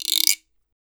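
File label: guiro long; E-MU Producer Series Vol. 7 – Old World Instruments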